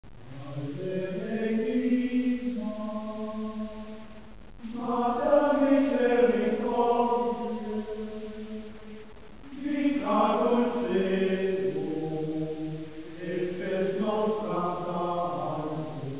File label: salve regina, gregorianik schola; kirchenmusik